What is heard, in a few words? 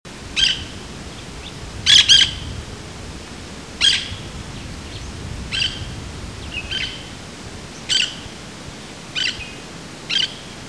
hispaniolan parrot